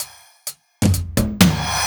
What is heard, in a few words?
Electro Fills